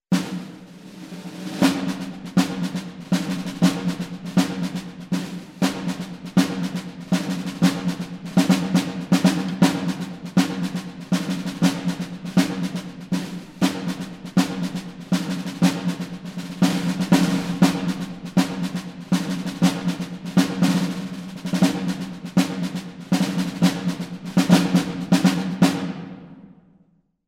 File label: March, snares; CineSnares; cinesamples